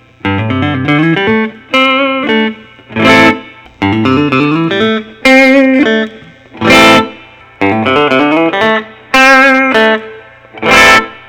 Lentz T custom mahogany vintage

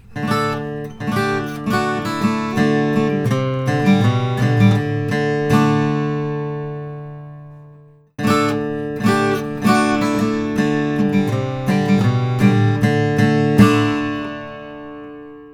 martin custom 000 14 fret

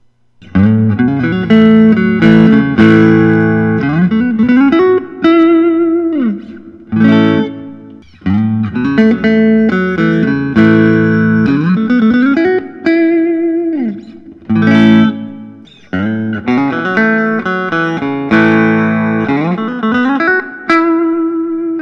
suhr classic t contoured trans white

